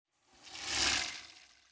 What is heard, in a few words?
Water Splash Rising; Whooshes